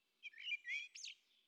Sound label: blackbird; EarlyMorning; FontainebleauDemo